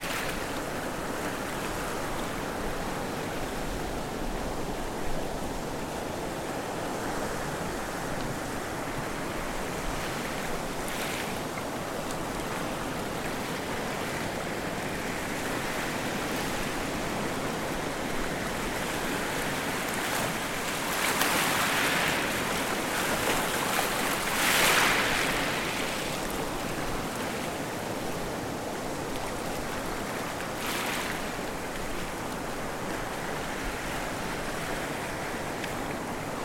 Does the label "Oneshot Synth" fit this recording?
No